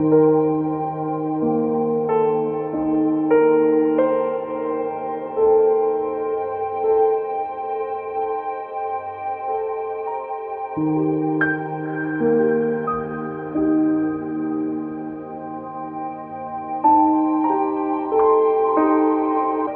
piano, sad

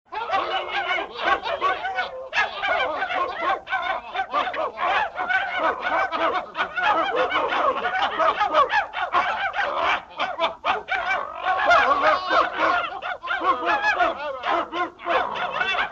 dog pack; Animals

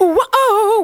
ooh wah oh, Voice; ooh ahh

